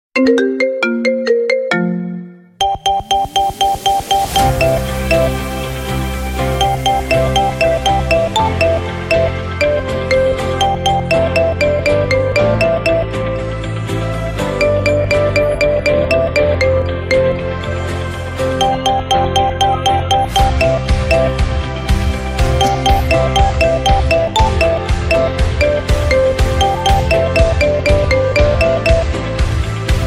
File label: Marimba